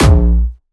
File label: B1, Jumpstyle Kick; Bassdrums; Jumpstyle Multis; vengeance essential club sounds-3; VEC3 Bassdrums